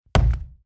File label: Hit